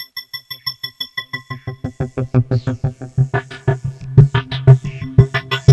SCIFI GROOVE, ROLAND JD-800

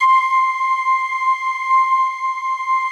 ST ORG, STRINGORGAN, Yamaha VP-1

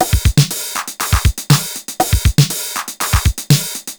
TR 505 (120BPM), beats; musicradar; cyborg beats

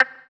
VIBRA WAH, guitar; Spectrasonics; Bizarre Guitar

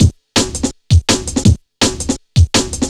dominica, Drum Bass Drumloops 165bpm; Zero-G; Total Drum Bass

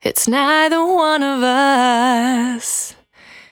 Cry 68bpm B, Vocal; Zero-G

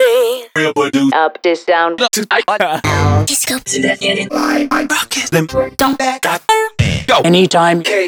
Synth Voc; Sliced

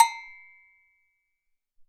Agogo Lo, Alesis SR-18

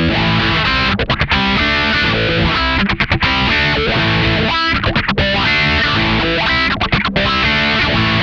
INDIWAH, Funk Guitar; 90 s Sample CDs; Zero G

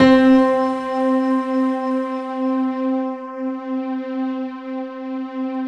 piano, Piano+String; Sound & Vision; Gigapack I CD 2

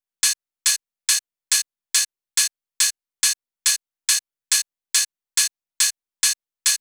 drum Loop 140BPM Full oh; Vengeance - Essential Clubsounds Vol.5; VEC5 Drumloops; VEC5 Multi Loops 140BPM

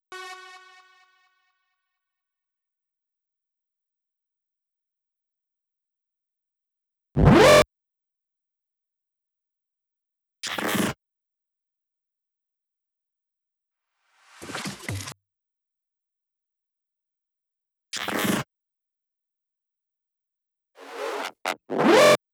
FX f#, Drum Loop 128BPM; VEC5 Drumloops; VEC5 Multi Loops 128BPM; Loop Elements

